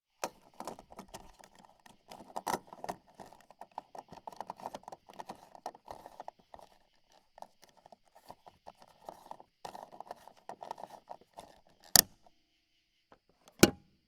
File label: plastic cap